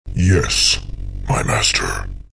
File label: lock chime; car locking